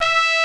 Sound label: BONES, TROMBONES; Greytsounds VOL-1